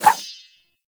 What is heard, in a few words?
Rapier Whoosh; Warframe; Weapons; Swords